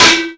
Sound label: bouncy metal tubes hit; bouncy objects; Tony Hawk's Project 8; XBOX